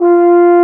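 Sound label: French Horn mf F2; Digital Sound Factory Virtuoso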